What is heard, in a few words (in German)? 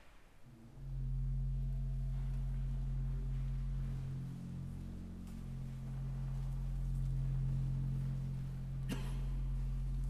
Orgelvorspiel; St. Aegidien